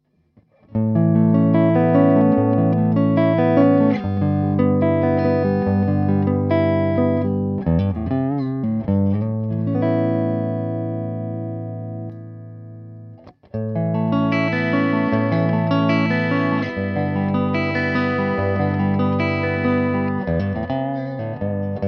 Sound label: Clean Picking